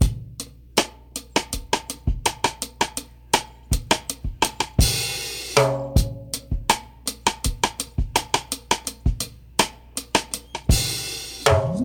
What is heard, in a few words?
108 bpm drum loop A key